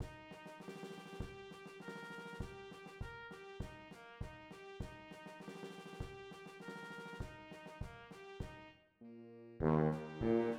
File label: Eb Tuba